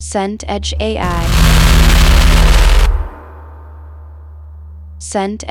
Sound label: horror drone dissonant